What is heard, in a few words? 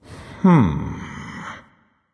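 thinking, nonverbal; smite; khepri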